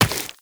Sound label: Run Rock, Footsteps; Warframe; Tenno